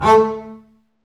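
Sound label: STRINGS, Cb Bowed Marcato; String Master Series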